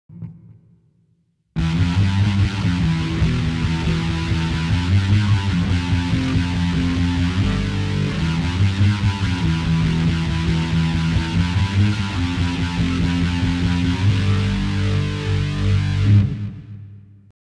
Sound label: Metal Riffs; Wasburn X50